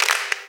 Clap; Vengeance Samples

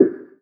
Percussive FX; Percussion; Vengeance Samples

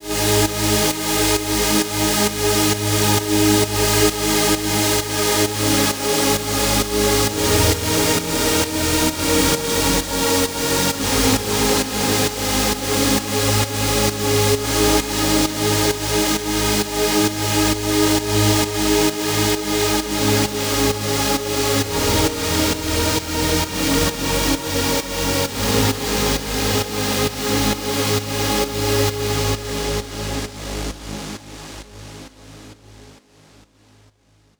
132BPM Full Pad Outro, G#major; Unlooped

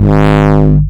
Ultimate Bass G# Root G#; Vengeance Samples

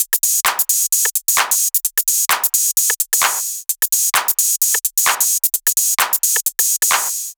130BPM Kit; Pressure 1 Kit

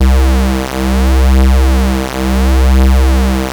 Ultimate Bass Root C; Vengeance Samples

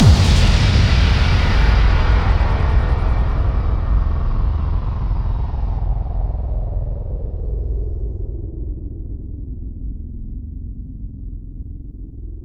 Bassdrums FX, FX Kicks; Vengeance Samples

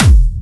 Kick; Stop!

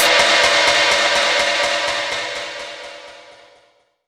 FX Downlifter

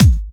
Bassdrums Breakbeat; Vengeance essential club sounds-3

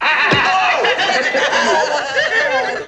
Ambiences, Places and Ambience

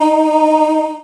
Synths D#; Vengeance Samples

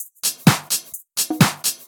House loops 128BPM; Vengeance Samples

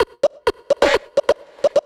House Loops, Vocal Loops House 128BPM

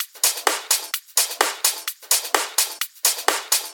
House Loop, 128 BPM; Vengeance Samples

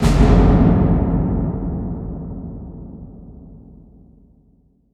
Hits & Explode

